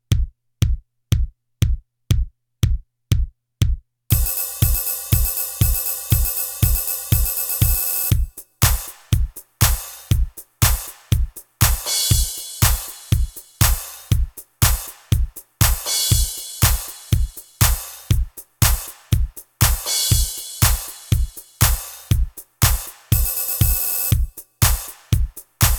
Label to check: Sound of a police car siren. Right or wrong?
Wrong